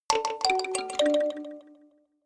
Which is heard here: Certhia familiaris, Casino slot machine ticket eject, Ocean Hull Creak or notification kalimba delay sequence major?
notification kalimba delay sequence major